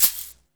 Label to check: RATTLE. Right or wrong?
Right